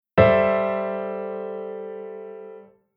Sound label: Chord Hybrid; Jissen